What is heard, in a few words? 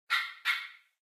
amb Dog Oct Up; willyshotchillies